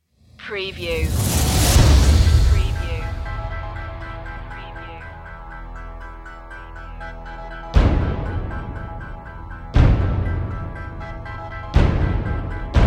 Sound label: percussion and fx only